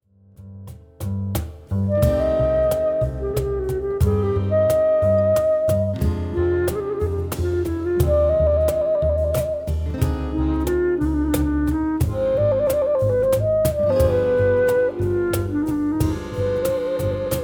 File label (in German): Klarinette